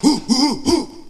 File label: whew woo woo woo; ooh ahh; human